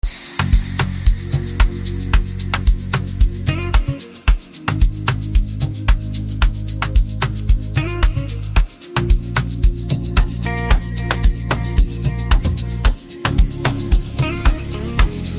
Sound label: beat